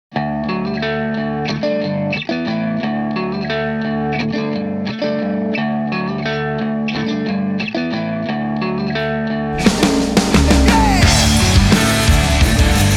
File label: Electric Guitar Hard Rock